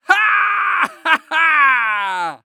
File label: Human Pirate Laugh